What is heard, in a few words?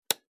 Metal flashlight button press to switch on or off